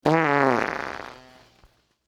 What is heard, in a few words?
funny fart prank